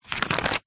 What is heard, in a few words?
household magazine glossy page turn